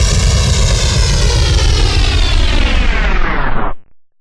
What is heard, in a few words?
Impacts